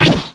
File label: salute